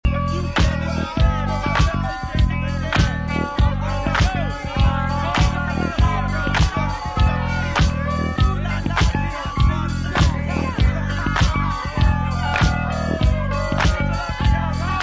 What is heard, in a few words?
Instrumental; disco